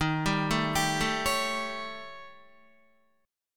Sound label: D sharp 7th Flat 5th, Guitar; arpeggiated; JGuitar